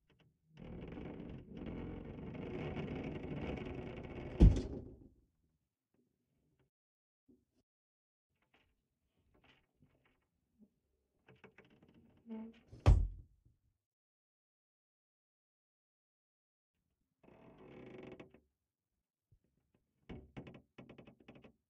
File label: Wardrobe; MiSide